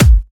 raw disco steel kick drum sound a key, Royality free kick drum single shot